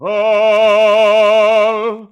male man, Voice; Notes

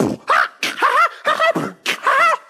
what after, Beatbox, Drum loops

